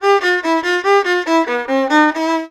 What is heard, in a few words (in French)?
VIOLON, ArabianTraditions; USB Soundscan vol.43; AKAI; VIOLRIFF1